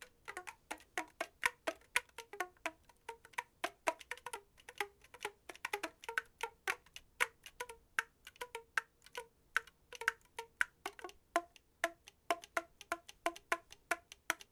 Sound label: flute